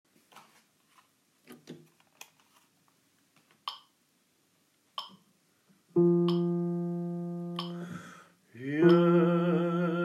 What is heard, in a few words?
Bass